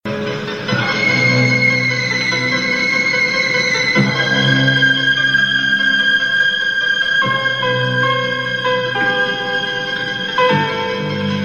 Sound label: dramatic